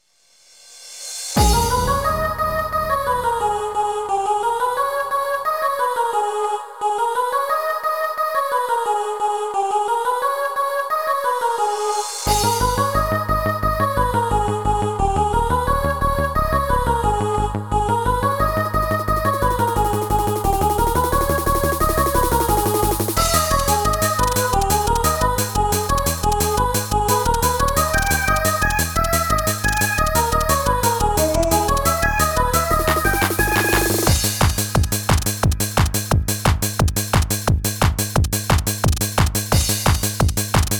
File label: RAIN